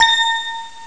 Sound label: disc glock smoll; ST-XX Amiga Soundtracker Sample Packs